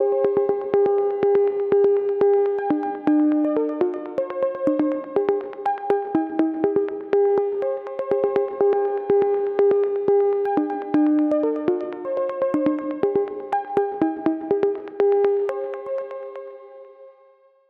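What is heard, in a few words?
122 BPM Question Answer Sessions A major G minor Tonic G; mmb studio melodic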